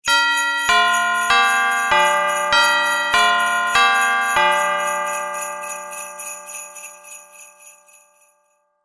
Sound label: christmas doorbell; bells